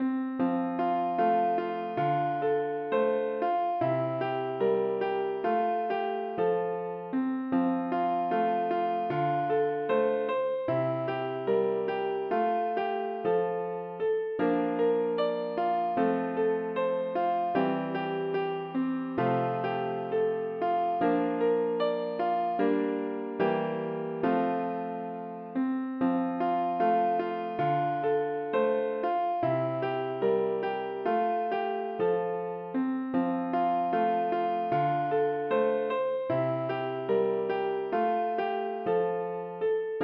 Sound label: piano